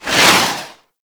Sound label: Heavy Orbital Strike Whiz By; Abilities; Warframe; Tau; Dax Heavy